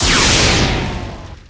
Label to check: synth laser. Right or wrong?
Right